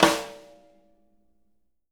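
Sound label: jazz snares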